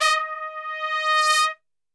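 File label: D#3 trumpet; Best Service; Brass Super Section; TRP SWL; 90 s Sample CDs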